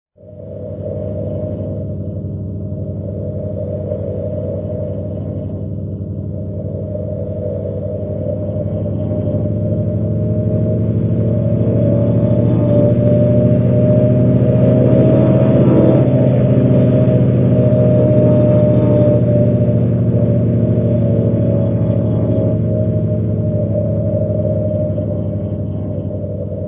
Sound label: twizy sound; emobil